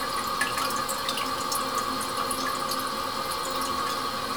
toilet refill loop

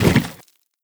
debris cardboard, bullet impact debris

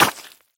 spew, infestation, bulb

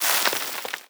debris sand, bullet impact debris

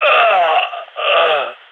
ct death; vo; gign

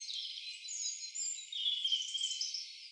birds 1shot

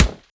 rubber, bullet impacts; physics; Half Life Model Pack

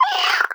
vox hungry start, creatures, snark

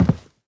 step light, footsteps cardboard

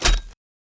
item drop common; ui

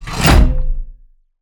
turret lost player; Counter Strike Model Pack; CS:GO; survival

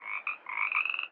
frog; animal

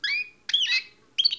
coast bird; Half Life Model Pack; Half Life 2; ambient levels

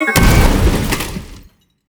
turret death; Counter Strike Model Pack; CS:GO; survival

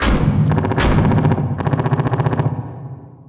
inside battle, prison; Half Life Model Pack; Half Life 2; ambient levels

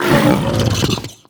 pull grunt, barnacle creatures; Half Life Alyx

